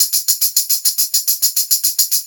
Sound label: Brass Tamb, Perc Loops; Drum Tools